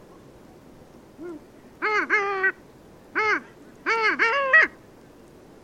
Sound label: Male Long-tailed Duck